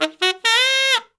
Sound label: SAX SOLO; Zero-G; Phantom Horns